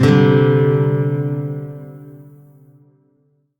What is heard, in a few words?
Shawzin Pent Maj Chord D; Music; Warframe; Lotus Shawzin